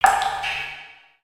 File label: Drip; ninjago